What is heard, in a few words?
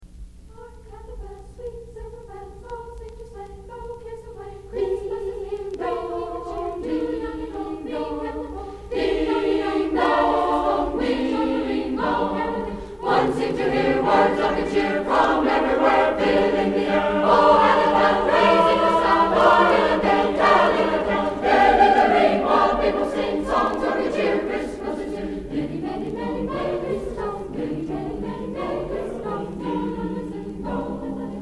A Choral Concert by the A Capella Choir